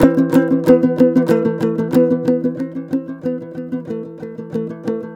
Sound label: TOPSHUR; Soundscan vol.60; Asian Traditions; AKAI